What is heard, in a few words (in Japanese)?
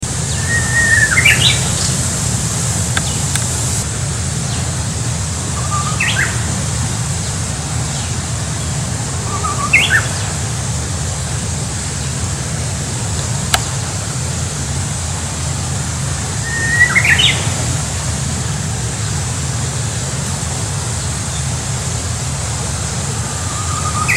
uguisu